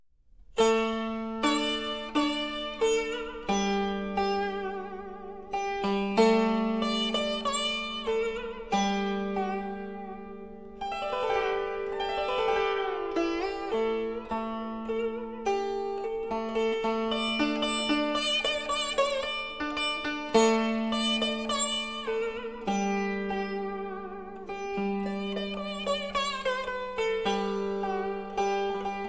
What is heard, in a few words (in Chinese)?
古箏斷絃效果; 雨果金碟